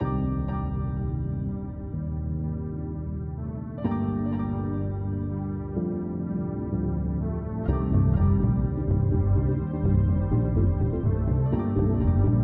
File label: Jingle